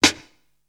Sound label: slo, snares; Zero-G - Funky Elements